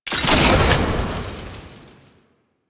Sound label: Alchemy Grenade Pickup Fire; Warframe; Entrati